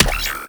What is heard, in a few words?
Drone Death; Warframe; Weapons; Archwing; Powers; Archwing Healer